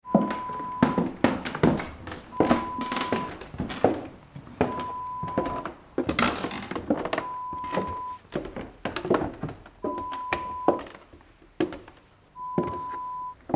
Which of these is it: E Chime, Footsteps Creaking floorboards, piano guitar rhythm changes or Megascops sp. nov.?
Footsteps Creaking floorboards